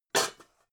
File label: pots stack